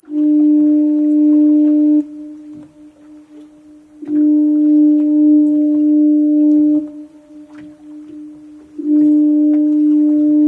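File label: rural distant foghorn; ambient; random amb sfx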